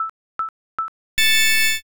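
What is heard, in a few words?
countdown new year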